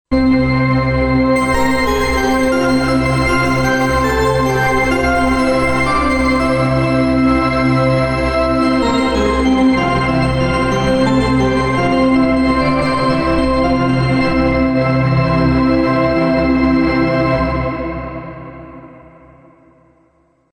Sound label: Cathedral Contemplation; Cypher2